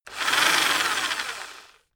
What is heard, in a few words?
rock drill rev low medium